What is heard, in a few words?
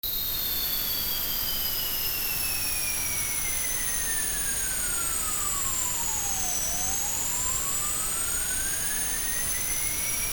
howling noise